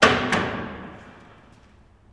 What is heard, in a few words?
Jail Door Open Rattl; jail & prison doors